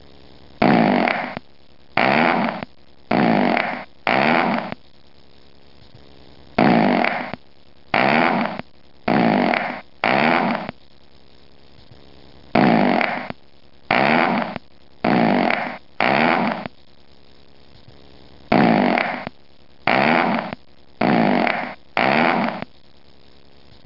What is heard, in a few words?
fart